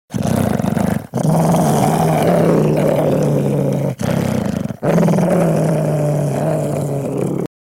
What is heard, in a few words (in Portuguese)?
lobisomen rosnando